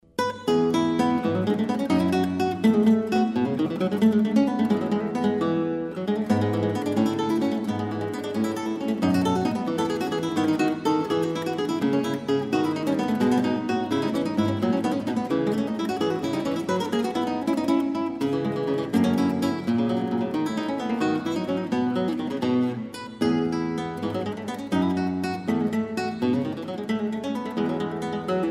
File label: BWV 1006 - Gigue